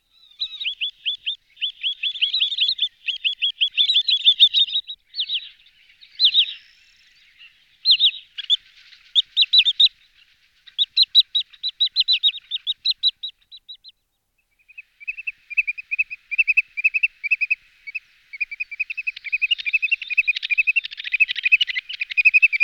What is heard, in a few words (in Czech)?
Vodous bahenni Tringa glareola